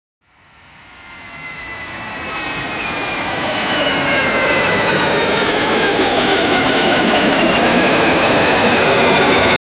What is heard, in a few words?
flight runs fast and take, flyby